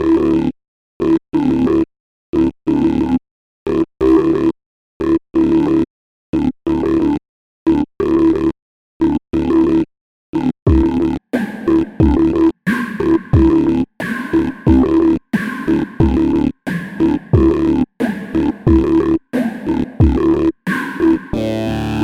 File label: Waldorf Largo 2 Formant Filter